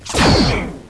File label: Photon Torp; Star Trek